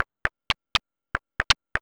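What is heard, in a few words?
synth sequence; SYNSEQ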